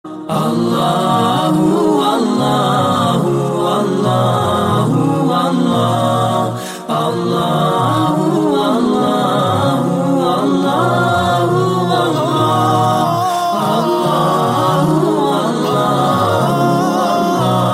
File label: allahu allahu ringtone